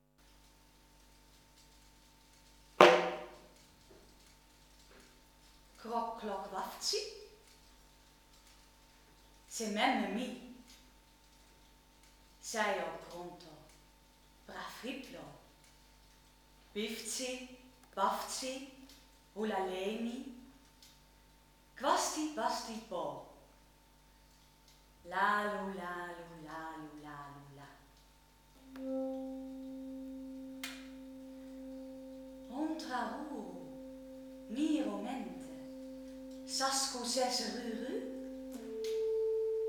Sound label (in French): voix; Das grosse Lalula